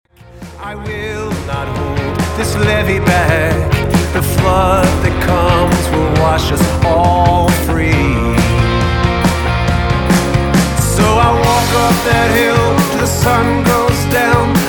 Scream

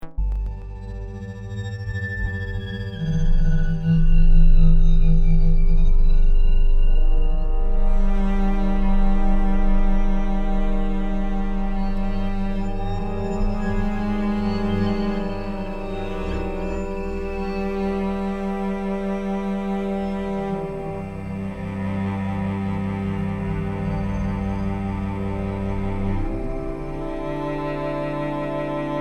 Techno Strings

